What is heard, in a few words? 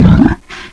heavy dash, zombie; Zombie Darkness